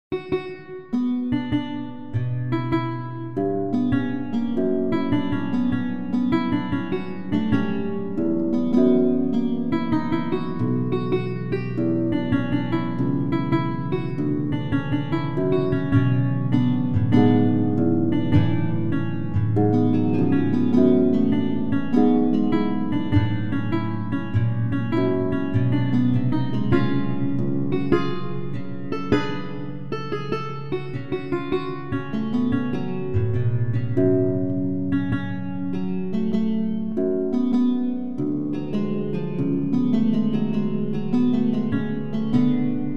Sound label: giga c-moll